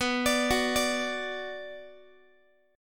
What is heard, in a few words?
B Minor B Guitar; arpeggiated; JGuitar